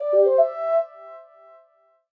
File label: win; ASCIIpOrtal